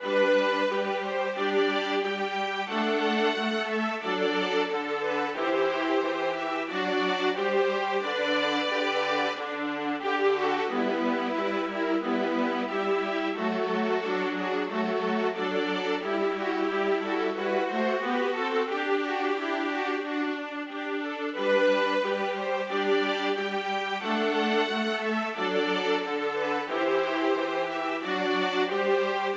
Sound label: Last Spring (Chamber Orchestra)